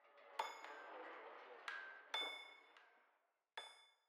amb building smithy; demacia rising

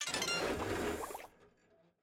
Turret Sequence Solved

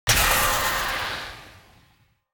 ui button click; spirit blossom

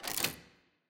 Lock Dial Up; Puzzle

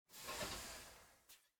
Rhino Large Smoke

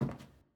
Footstep Hallway

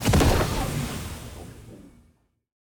enemy spawn; bloom minigame

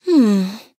Nonverbal Think; Aurora

